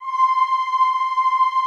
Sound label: EEH C5, Classical Choir; Best Service; Extended Classical Choir